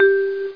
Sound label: error; Informática Multimedia V-96 Curso interactivo de Visual Basic